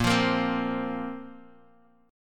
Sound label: A sharp Suspended 2nd, Guitar DADGAD